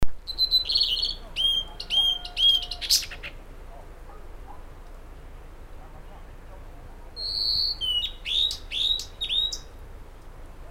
flycatcher blue and white Cyanoptila cyanomelana intermedia